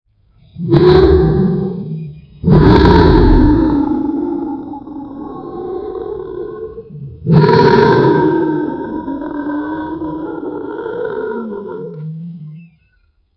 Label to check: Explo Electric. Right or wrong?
Wrong